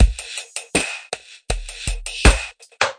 80 bpm show me some shimmer, drum loops and breaks; 000 to 080 bpm